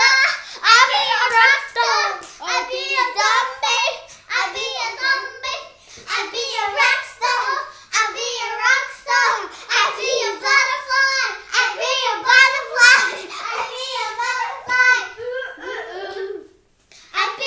satuday morning noise

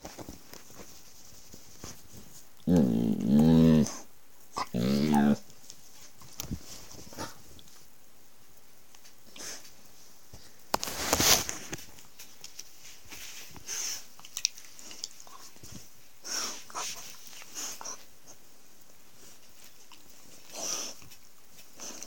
growling playful pup

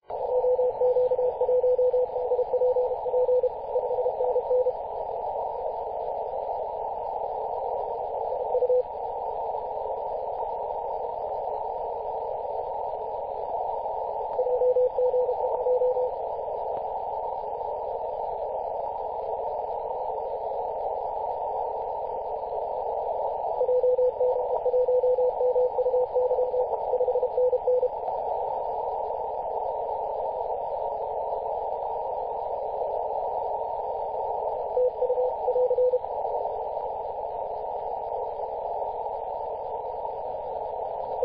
7.019MHz CW

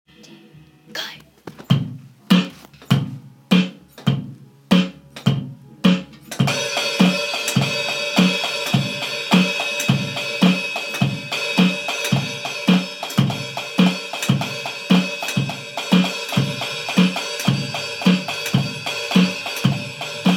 live drum recording

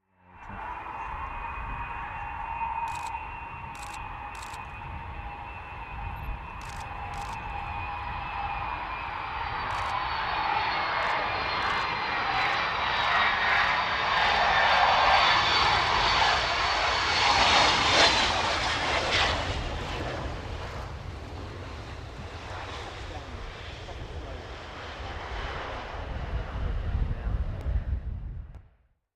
Nimrod R1 flies into East Midlands; xw664; callsign nimrod1

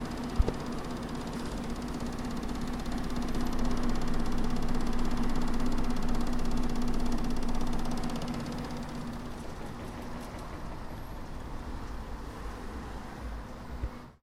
launderette spin

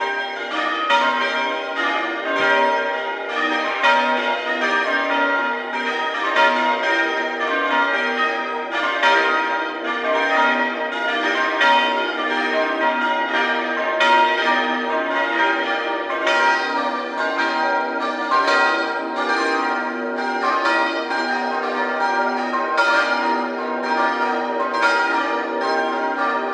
church bell practice; Mildenhall

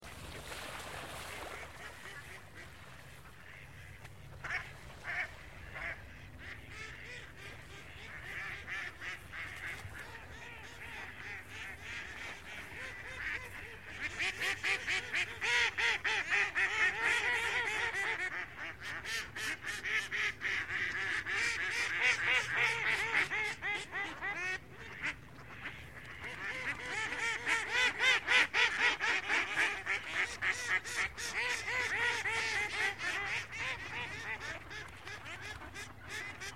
noisy ducks on the lake

